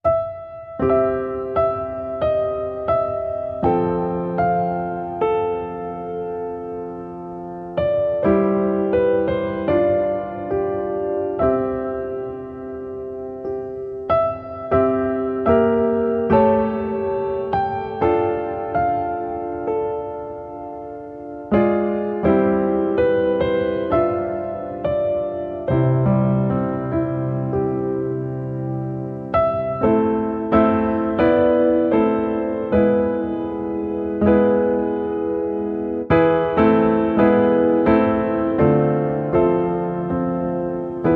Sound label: Little Town Of Bethlehem, Piano